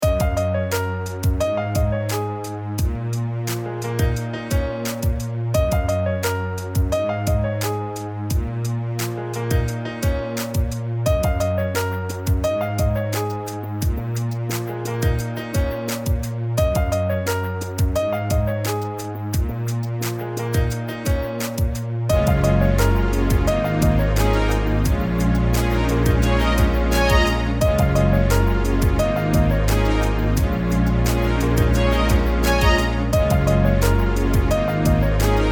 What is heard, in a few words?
Groove; Set in the Eastern Cape of South Africa